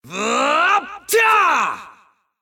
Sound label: attack; Bad Brawler